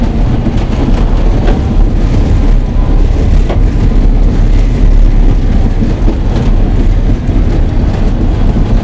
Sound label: Vehicle Engines; Red Orchestra Ostfront 41-45